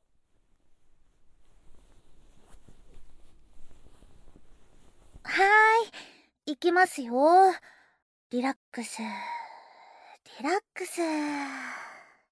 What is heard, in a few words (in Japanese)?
ASMR マッサージ